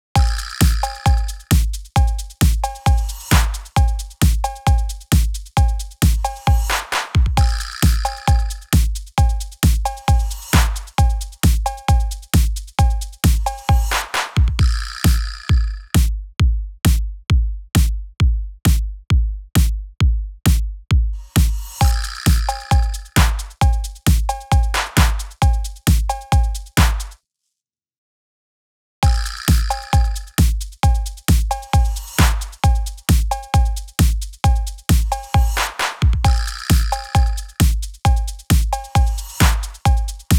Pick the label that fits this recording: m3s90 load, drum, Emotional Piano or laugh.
drum